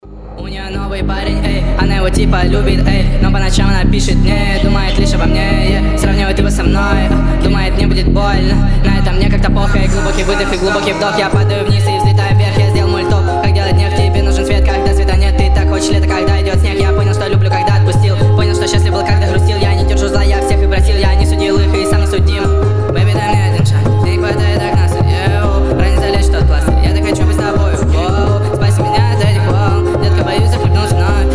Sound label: sped up reverb